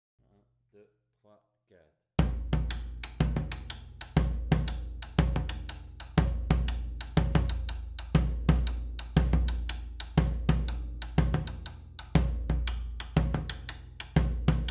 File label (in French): Afro Ternaire 3x3 Bass, Batucada